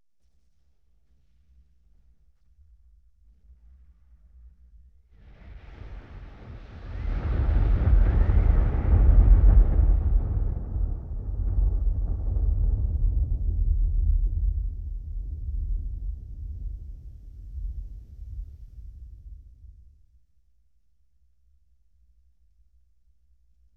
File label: Explosion, 5.1 Surround; Sony Pictures Sound Effects Series